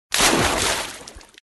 water splash

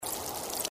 drop water

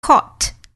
cought

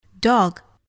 dog

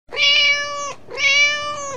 cat Meow

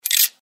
coin Drop single

